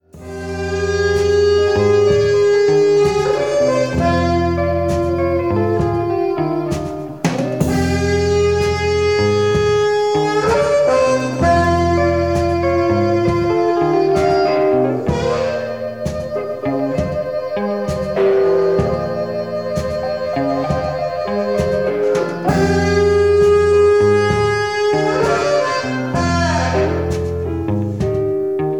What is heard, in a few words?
Trill